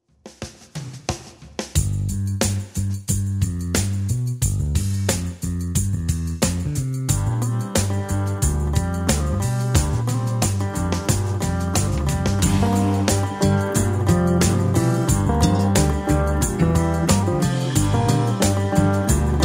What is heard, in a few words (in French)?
GUITARE VS DOBRO